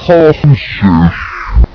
die; svencoop; cracklife; agrunt

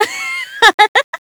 laughter; svencoop; player sounds; lappland

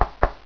knock

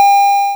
pong win; w00tguy; arcade

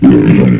pain, ogre; svencoop; legends

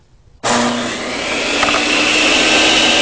saw blade

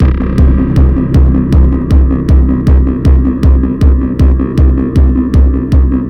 radio; svencoop; sc toysoldiers

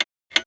tick; svencoop; bman

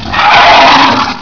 alert; svencoop; classic; imp

